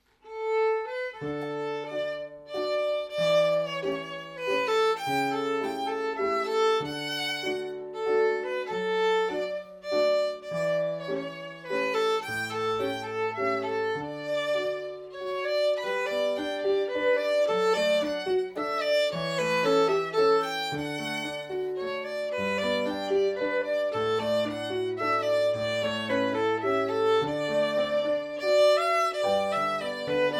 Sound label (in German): Walzer